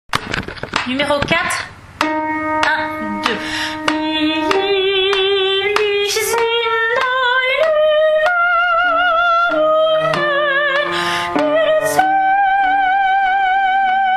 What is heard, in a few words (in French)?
Brahms requiem allemand soprano